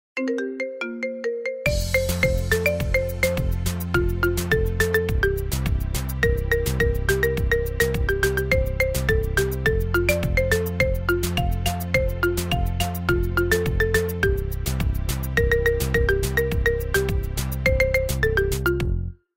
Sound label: My Bestie Marimba